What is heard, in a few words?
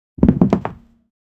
human body falling and, interior